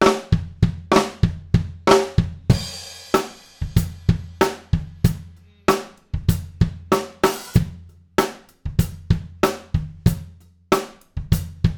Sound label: Kick, drum; wpdrumshootout; drum mic madness